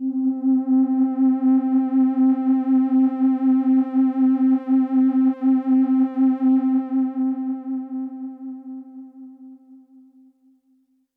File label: Pad C, Synth Prophet One Shots; Electronisounds